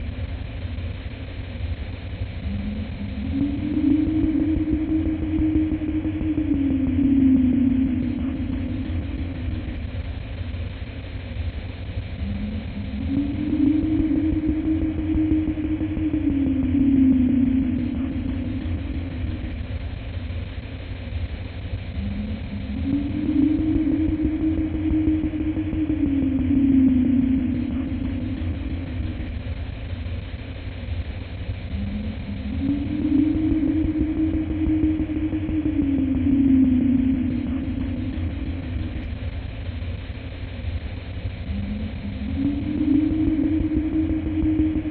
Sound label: ominous factory